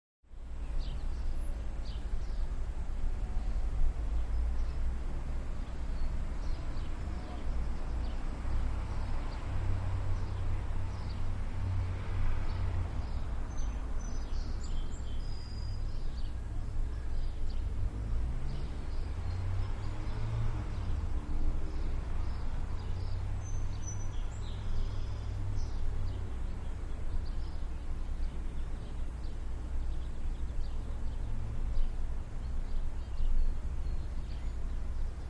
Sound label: Traffic Suburban Day